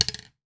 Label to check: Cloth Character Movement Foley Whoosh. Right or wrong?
Wrong